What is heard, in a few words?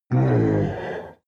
demon dark voice thick groan; Creatures; kreaturen